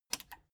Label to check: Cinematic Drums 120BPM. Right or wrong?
Wrong